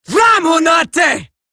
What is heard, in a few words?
attack, female